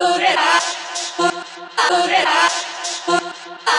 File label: chopped vocals ping pong house delayed